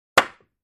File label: Ice hockey puck impact hit